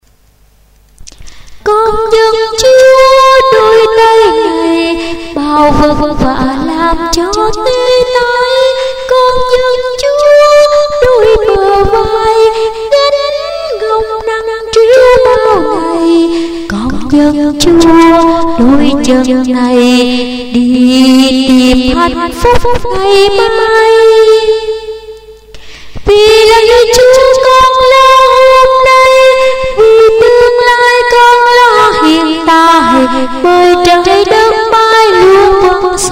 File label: tenor basso